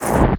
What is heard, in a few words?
Void Exit; Warframe; Focus; Operators; Operator Wraith Movement